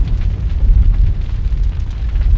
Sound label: Supernova Amb Bed Lp; Ambient; Sid Meier's Civilization 4 Complete; Beyond the Sword